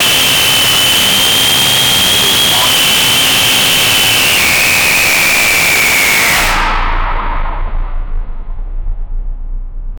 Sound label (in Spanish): sonido de sierra mecanica; tool